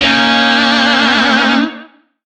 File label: Shawzin Yo Chord K; Music; DE; Warframe; Lizzie Shawzin